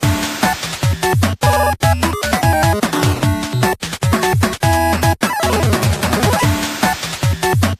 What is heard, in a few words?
Jingle Mail; Jingle Mail Coro2